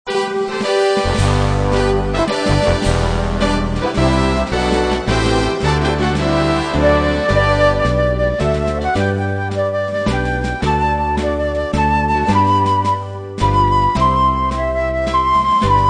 Instrumental Hymns

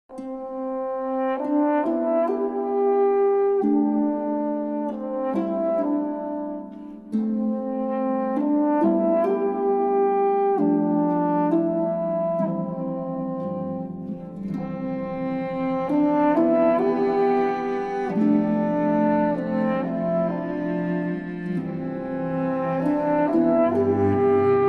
Hymns For Organ, Brass And Pe

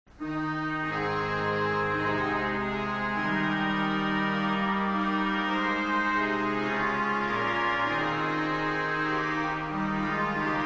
Cathedral Organ And Chimes

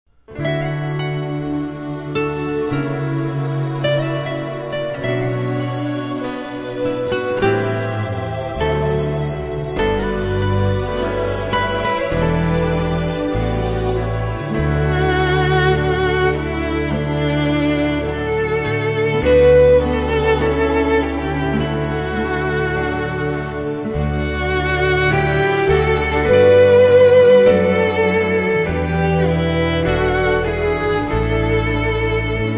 Instrumental Praise

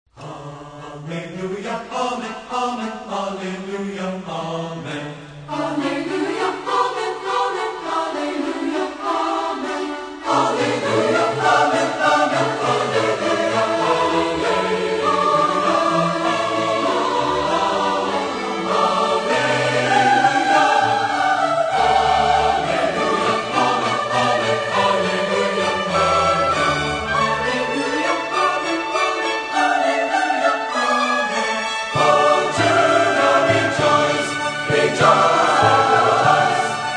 Choir And Orchestra

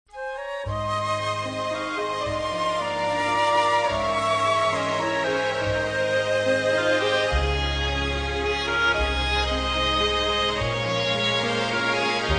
Trio, His Way is Perfect

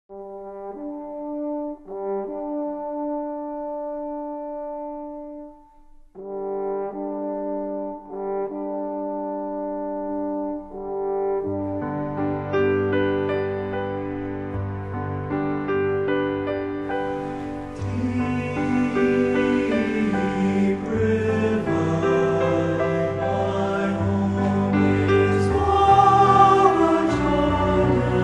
chorale; deep river

